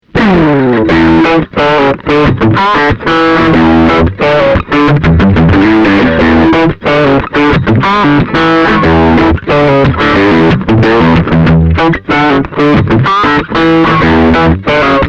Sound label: Tech 21 Sansamp Classic Fuzz